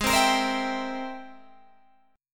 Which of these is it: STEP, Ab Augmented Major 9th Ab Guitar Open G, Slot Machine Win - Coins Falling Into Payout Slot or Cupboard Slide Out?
Ab Augmented Major 9th Ab Guitar Open G